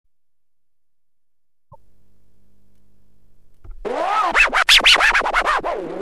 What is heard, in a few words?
Halloween specail muhahah, Drum and Bass Jungle; UK Bass Radio